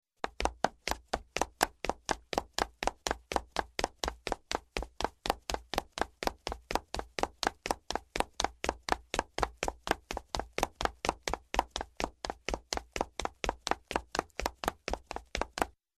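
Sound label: male fast wooden, footsteps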